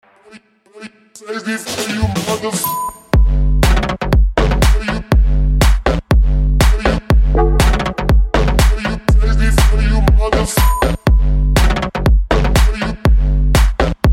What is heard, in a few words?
bass; dj package